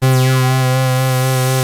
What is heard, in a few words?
OB 19 C4, Rare Synthesizer; Hollywood Edge - Giorgio Moroder Rare Synthesizer Collection; OB 4 VOX.